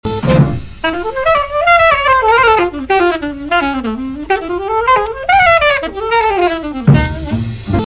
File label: Tunisia Break